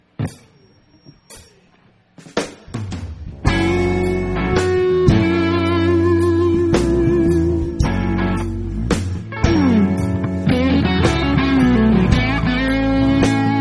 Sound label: jazz